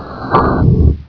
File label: door stop; gearbox